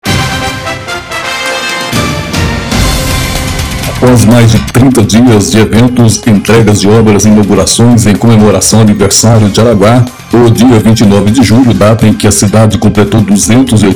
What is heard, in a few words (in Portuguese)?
VINHETA; JARAGUA